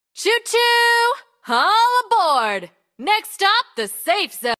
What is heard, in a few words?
SMS ringtone for girls